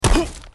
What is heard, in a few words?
hit; csgo